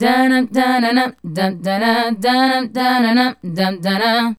Female Group, Hip-Hop and RnB Vocals

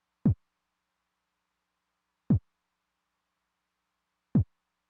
kick drum, Roland TR-909; Roland TR-909 Ableton Project

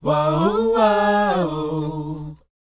WHOA D#, Vocal R&B & Dance; ILIO; Vocal Planet

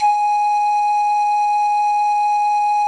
PAN FLUTE G#4

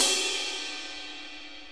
Big Ride, Cymbals; Sonic Foundry (Sony Creative Software); Loops & Samples Drum Tools

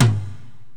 Korg x5, Drums

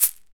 SHEKE, Afro Shakers, Shekeres

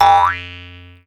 Jaw Harp; musicradar; cartoon caper

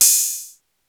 808 OPEN HAT; Greytsounds VOL-1; CLASSMACHINE

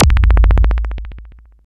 FX, attacks

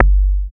BD ANALOG; Sound & Vision - Gigapack II CD 2

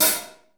HH 1O1 R, DRUMKIT

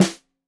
Snare 65 Reg Stereo, Deeply Sampled Drums

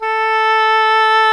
SOLO WINDS

